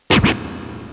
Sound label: Scratch